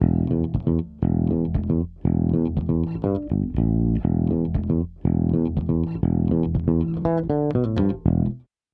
IGS Audio ONE LA 500 E Bass Medium Compressor

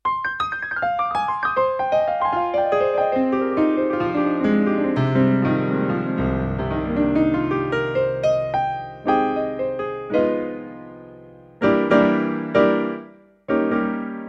Piano

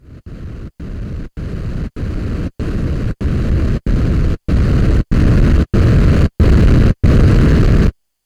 Noise

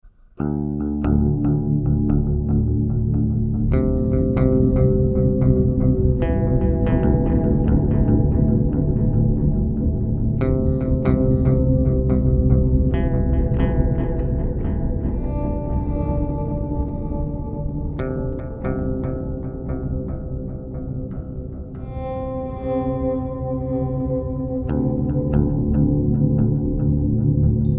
Meris Hedra

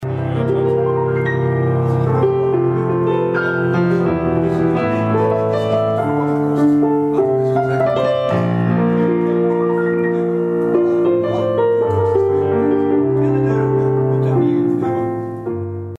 Yamaha YDP142

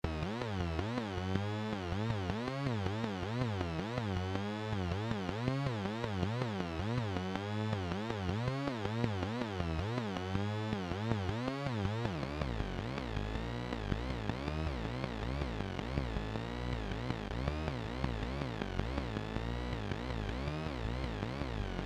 SID 30V Mixer

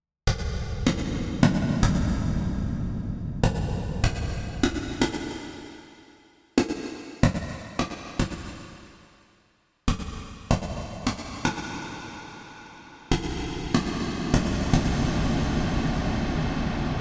Reverb

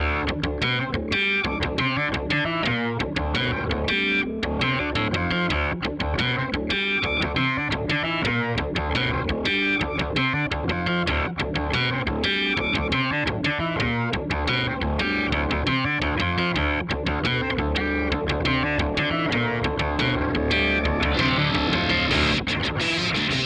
Source Audio Ultrawave SA 250

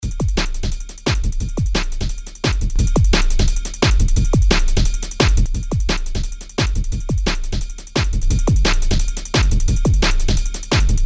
Compressor II

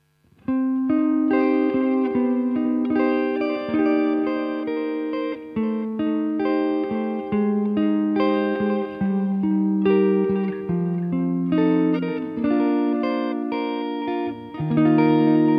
Fender Deluxe Tape Delay

